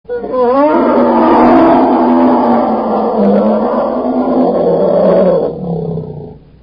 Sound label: Grizzly Bear Growl Sounds Car Horn